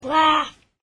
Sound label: laughed